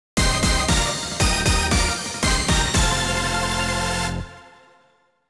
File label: Victory!; Pokémon Legends Z-A; Switch 2; Victory! (Rogue Mega Pokémon)